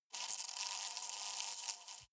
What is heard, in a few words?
hornet hum glass aggressive